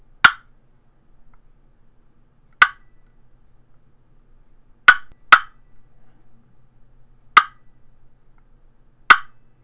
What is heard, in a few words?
golden deluxe poplar custom box call clucks, turkey; Southland Sounds